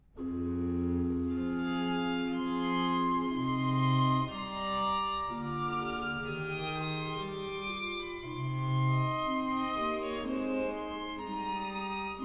Violini; Buxtehude; Opera Omnia; Vocal Works

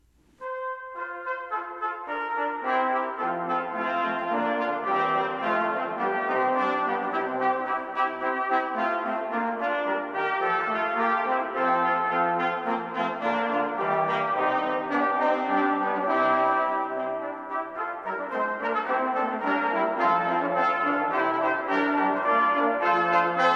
Fanfare Orchestra Leggiero

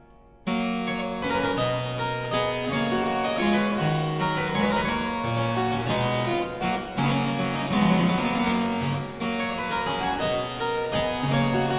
Aria Variations in G Major, Harpsichord

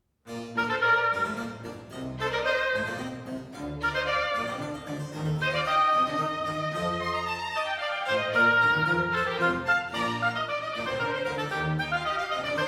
Oboe Concerto in B flat major - Allegro; Handel; Oboe Concerto No. 2; HWV 302a

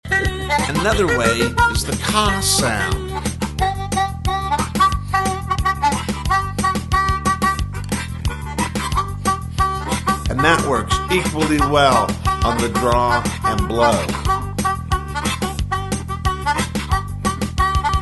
Ka Ka Kawing, harmonica

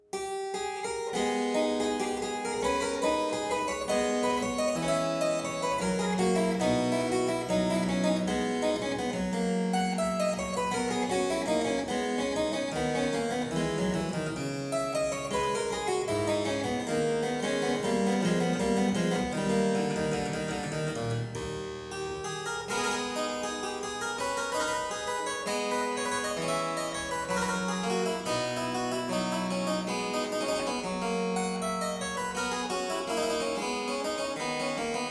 Courante in A minor - Variatio, harpsichord; Buxtehude; Complete Harpsichord Music; Courante zimble; BuxWV245

